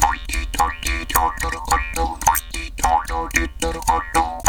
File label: Jaw Grooves, Didgeridoo and Other Primitive Instruments; Big Fish Audio - Didgeridoo and Other Primitive Instruments